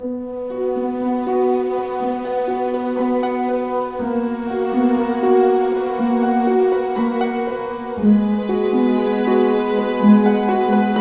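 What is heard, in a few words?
60 sad, orchestra; East West; Scoring Tools; Sad Story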